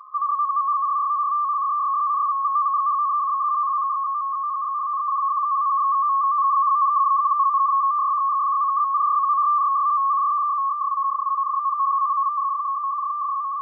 KFF-58, SUI-MIL 68.57 PSEUDO#

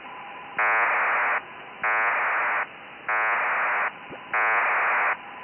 PACTOR-IV 1800 DBPSK

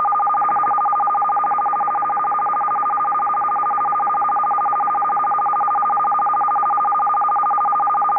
FEC-A; FEC-A-192 idling with RQs

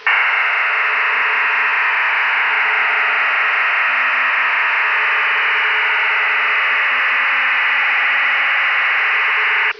MIL188-110A 300L; MIL-STD 188-110A/B serial tone waveform with 300bps and LONG interleaving

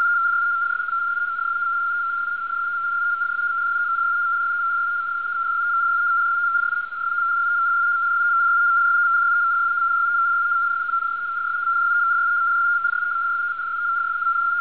ISR-75 IDLE; ISRAELI-INTEL VFT SYSTEM; isolated 75 Bd channel in idle condition

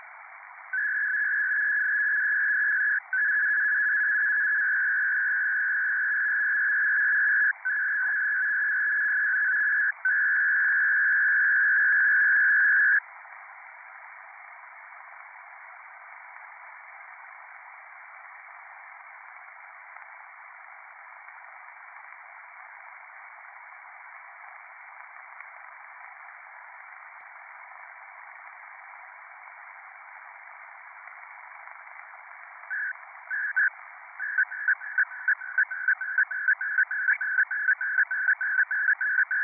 CHP-200 SELCALL ARQ; SIEMENS CHP-200 system during selcall-procedure, successfully linking and then into non-FH ARQ mode; SIEMENS CHP-200 FSK-SYSTEM

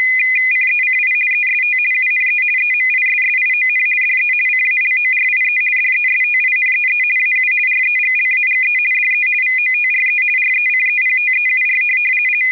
BAUDOT 2 STOPBIT; FSK

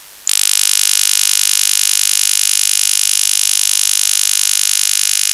RUS 50Hz 10kHz OTHR; Russian OTHR system at start of transmission (note the short ~3ms "carrier" before the transmission); ALE-systems, tone calls, ionosondes & chirpsounders

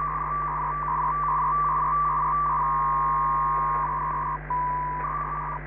ALIS1, ALIS (version 1); ROHDE & SCHWARZ

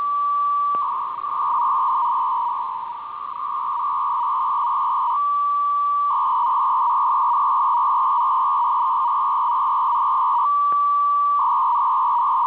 CIS 300.5 Bd BURST

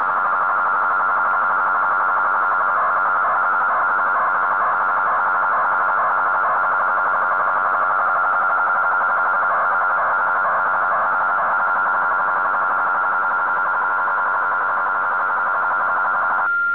BUL IRA ARQ 1000 Bd, Bulgarian ASCII ARQ-system; Bulgarian ASCII ARQ-system running at 1000 Bd

